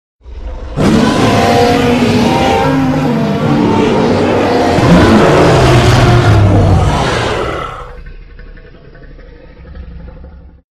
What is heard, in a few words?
dragon